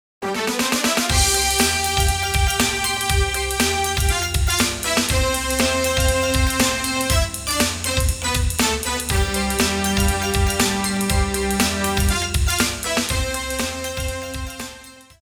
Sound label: Level Complete; Shipwreckers; PS1; Caribbean